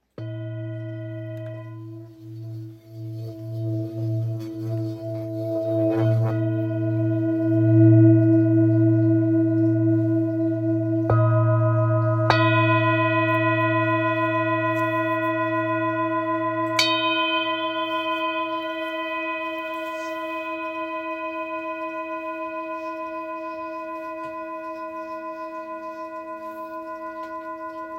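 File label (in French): Thérapeute LA, bols chantants tibétains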